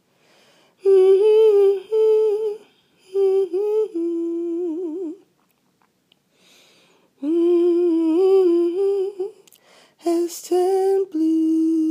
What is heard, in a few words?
Maybe melody